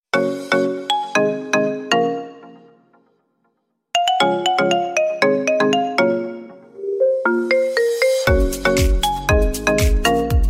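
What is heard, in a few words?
Samsung Galaxy S25 Ultra, 着信音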